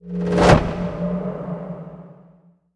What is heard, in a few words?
checkpoint; gmod; paris